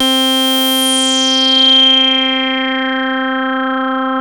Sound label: MOOG, BASSES